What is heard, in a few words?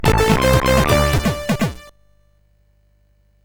sid CSG8580R5, GAMES; Break Down